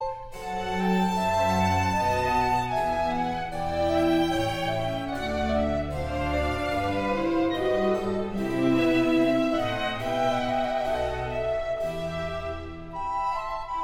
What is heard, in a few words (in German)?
brandenburgisches konzert nr 4 g dur bwv 1049 andante